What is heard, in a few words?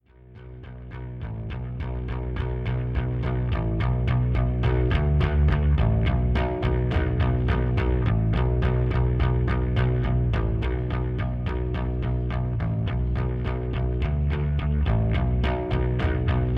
bass battle; scott pilgrim SCORE